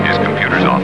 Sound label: computer